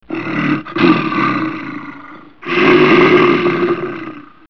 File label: bear growling sound